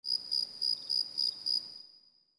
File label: Cricket